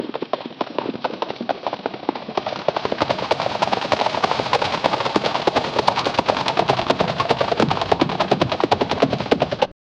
army horses formation

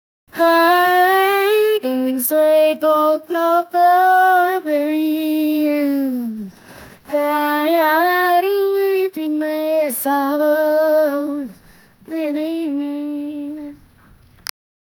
the woman sings the phras, expressive

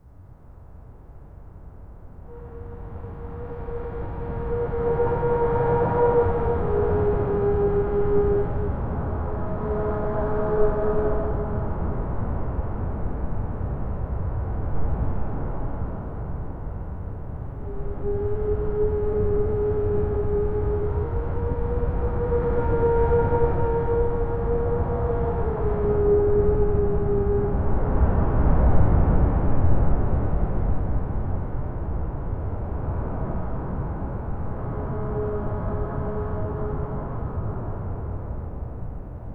a howling wind weaves, metal clatter

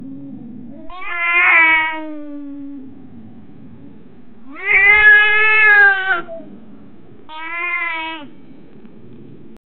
cat is laughing

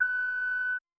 error sound, beep

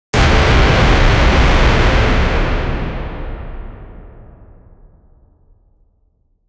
massive cinematic war hor, horn

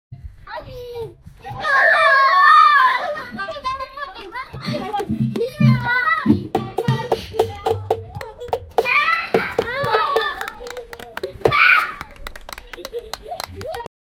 children dancing on a dru

